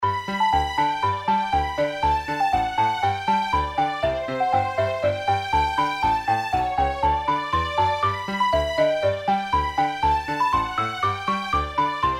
circus outro; Crazy Circus

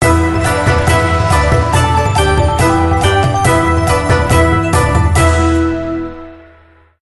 win increase win level

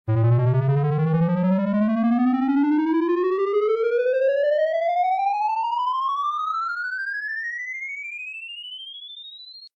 collect go; Dazzling Diamonds

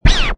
change bet; American Poker The Legends II

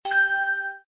WIN SMALL; games; Cash Runner

